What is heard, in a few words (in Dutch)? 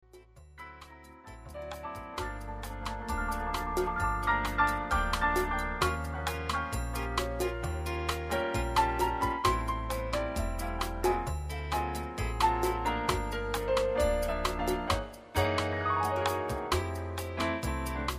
PIANO + ORKESTBEGELEIDING